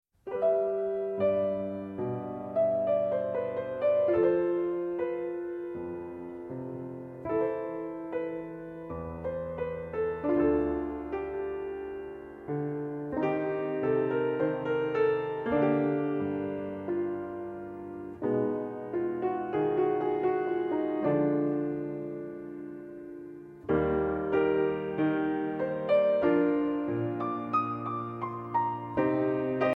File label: piano